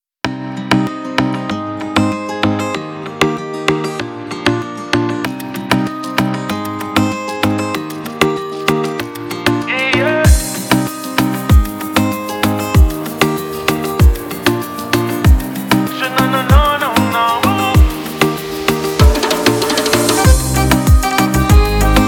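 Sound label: ispanyol latin reggaeton; By Burak Sound Bank; latin ispanyol tema vol 1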